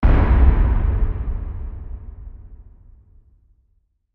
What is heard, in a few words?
Bass Impact Low Drum; impacts